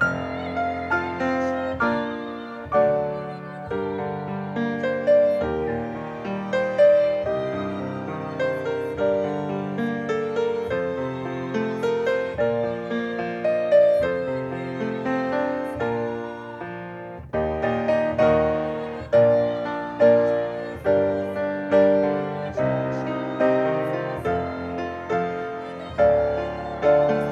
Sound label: Piano